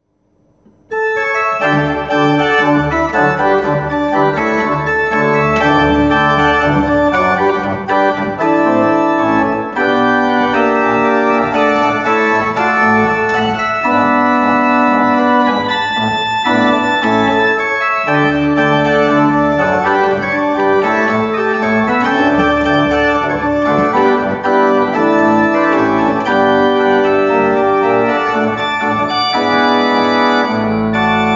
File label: Jazz-Pop